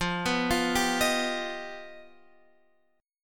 G Augmented 7th

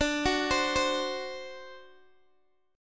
D 7th D Guitar DADGAD

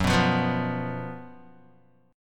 Gb Major 7th Suspended 4th Gb Guitar; GbM7sus4; strummed; JGuitar

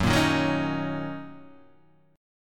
Fsharp 6th down Guitar Half Step Down

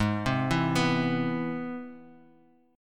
C Augmented Major 7th, chord; C+M7/Ab arpeggiated

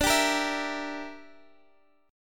Bb 6th Flat 5th D

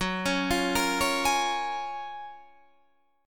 Gb Major 9th, down, Guitar, Standard; arpeggiated; JGuitar

